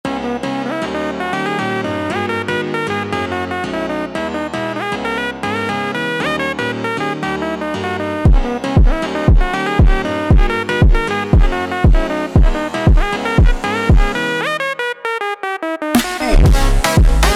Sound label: Funk slowed